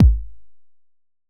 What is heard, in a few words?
kick drive